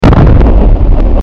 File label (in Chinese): 电视包装音乐